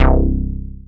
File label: bass, synths